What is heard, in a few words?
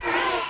land idle; toxifin